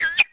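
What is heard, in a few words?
ambience bird short; Tony Hawk's Project 8; XBOX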